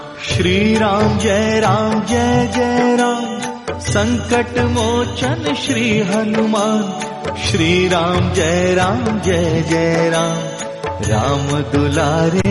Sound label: jai shree ram notification ringtone tone; jay shree ram sms ringtones